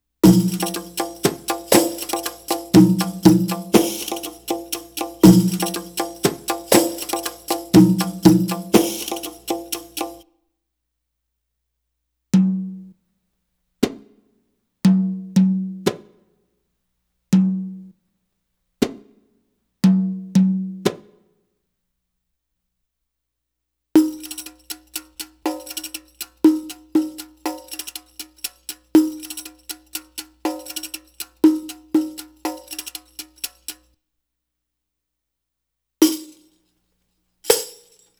Arabic Rhythms

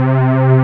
JUNO STR; E-MU Formula 4000 Series Vol. 3 – Analog Odyssey; Vintage Keys

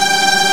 SHIMMER, FAIRLIGHT CMI IIX STRINGS

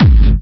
hard bass drumz

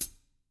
HTRA FOOT, Drums, TAMA; ILIO; Double Platinum Drums 1; TAMA+TAMA

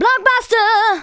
VOCALS SUNG; Techno Trance Essentials

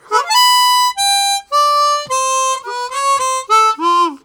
Chrom Run, Harmonica, Riffs; Zero G

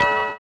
orient, bizarre guitar; Spectrasonics

